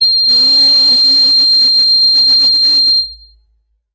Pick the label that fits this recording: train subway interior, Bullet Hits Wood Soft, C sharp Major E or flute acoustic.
flute acoustic